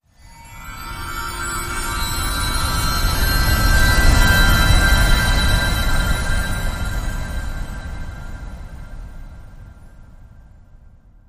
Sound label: Fairy Magic Transition